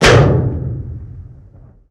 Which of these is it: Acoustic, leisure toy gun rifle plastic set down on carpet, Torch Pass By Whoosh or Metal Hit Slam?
Metal Hit Slam